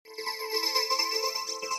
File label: Keys